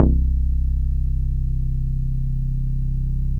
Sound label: TUBE C1, BASSES